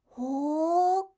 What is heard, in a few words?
hoo, reaction, voice